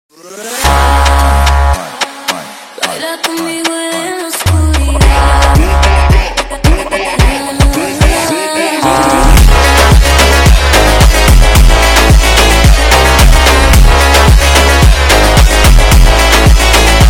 Phonk MONTAGEM

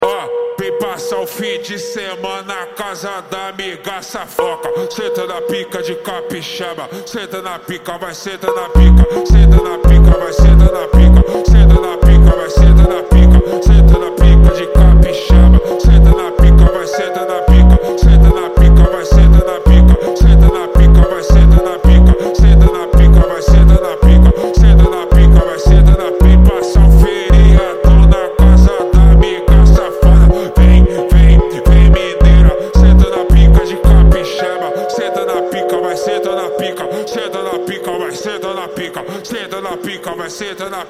Phonk MONTAGEM

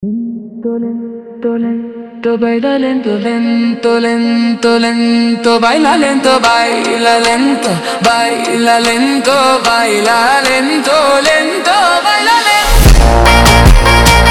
Phonk Slowed

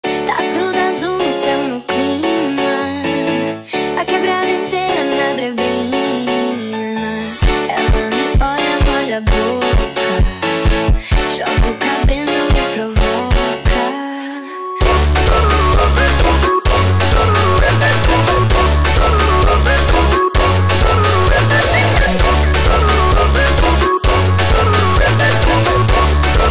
Phonk Montagem

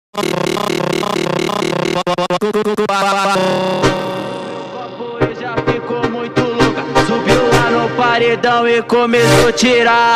Phonk MONTAGEM

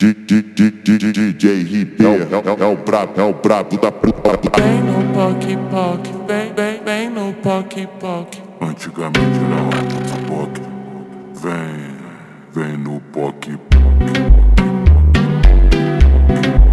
Phonk MONTAGEM Super Slowed

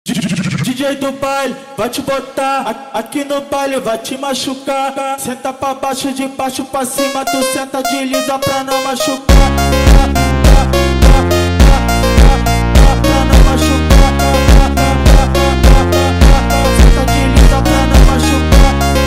Phonk MONTAGEM

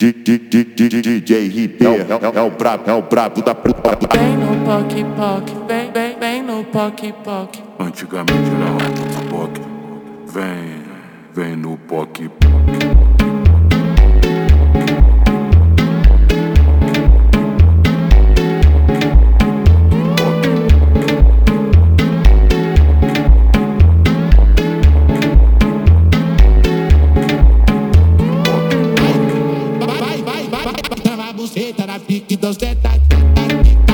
Phonk MONTAGEM Slowed

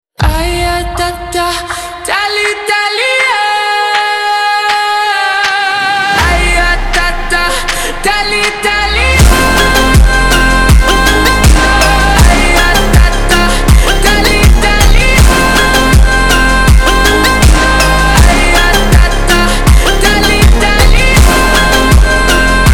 Phonk Slowed

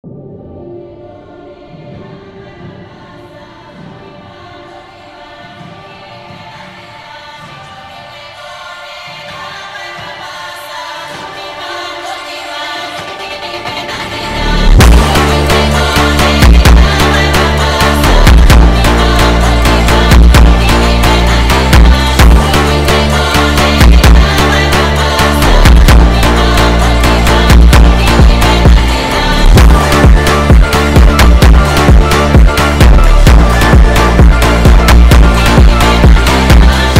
Phonk

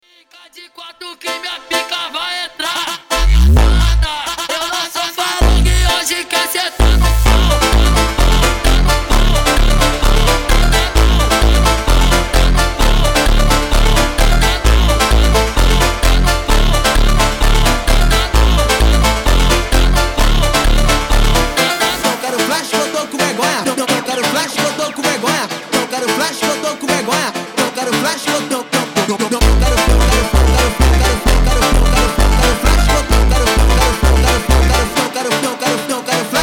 Phonk MONTAGEM

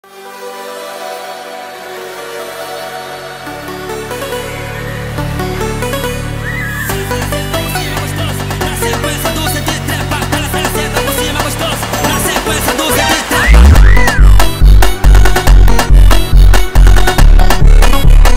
Phonk

